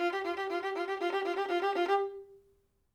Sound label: violin